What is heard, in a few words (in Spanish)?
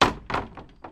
puerta